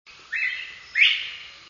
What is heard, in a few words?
bob white; Loft Mountain Campground, Shenandoah National Park